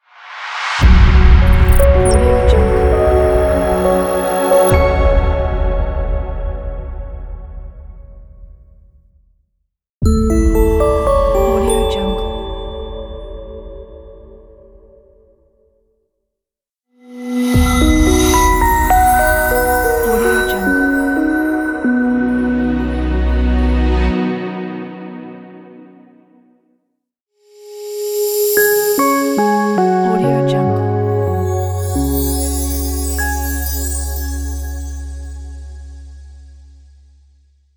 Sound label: logo bundle; kirillluzin